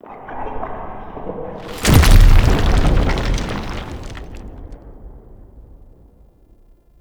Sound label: War Room Rock Fall Close; Warframe; Cinematics; The New War; Lua War Room